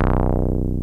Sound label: 303 MEL, BASSES; Pro-Rec - Synthsonic